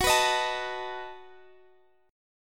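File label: B 7th G flat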